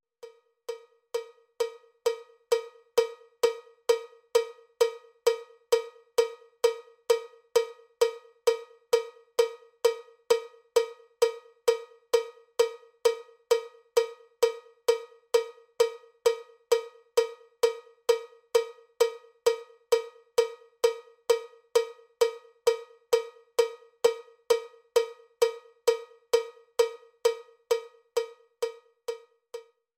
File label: cowbell click track bpm 79; cowbell click track bpm 131